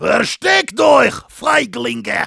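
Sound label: Heavy yell, German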